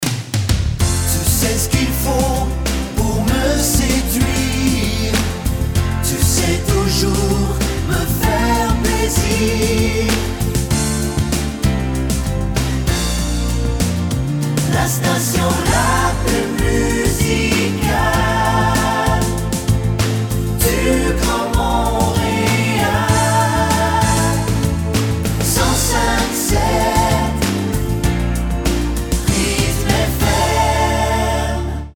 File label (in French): RYTHME; pub-radio